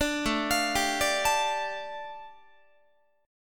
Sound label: A 7th Suspended 4th Sharp 5th A, Guitar; A7sus4#5 arpeggiated; 17,17,20,17,18,20; 4A,4E,5A,5D,5G#,6C#